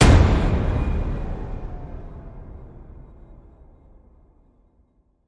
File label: Hit Shooter